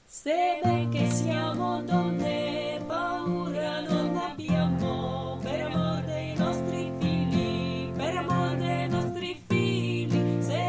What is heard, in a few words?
LaLega 2 voix guitare; La Lega est une chanson de lutte italienne originaire de la région de Padoue